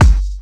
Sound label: Kik; Rawcutz - Nu Forms